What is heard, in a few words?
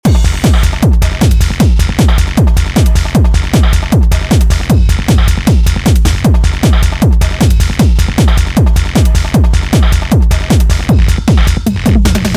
Techno; Drum Blaster: The Ultimate Beat Generator, Sampler Plugin for Modern Producers